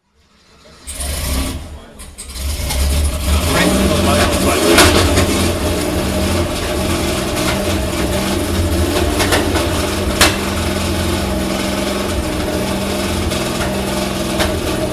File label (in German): Porsche 911 SC Safari, Motorgeräusch des Porsche 911 SC Safari; 1978; Motorgeräusch des Porsche 911 SC Safari (1978) an der Porsche Sound-Nacht